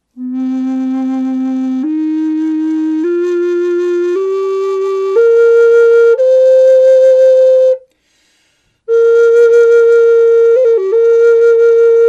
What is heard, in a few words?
Taos Old Style